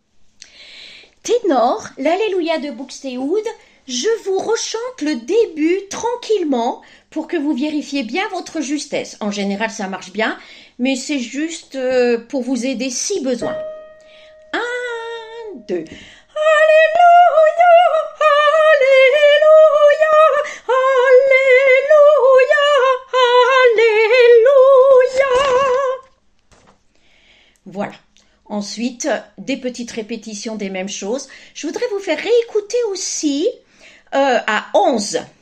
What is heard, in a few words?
TENOR; BUXTEHUDE